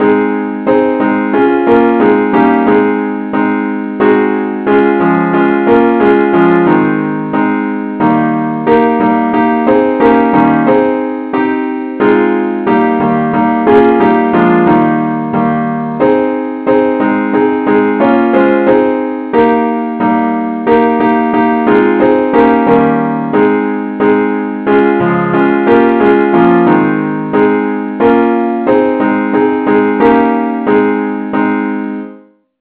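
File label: advance accompaniment key aes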